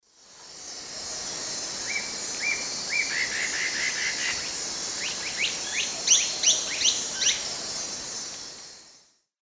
Megastictus margaritatus, song and call, S. America Eastern Lowlands